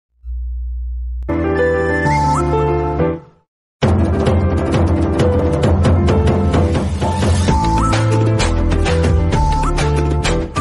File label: bus chase bgm ringtone; pagal; vishwak sen; nivetha; naresh kuppili